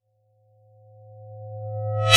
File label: Swell Ceramic Ring; bottlerocket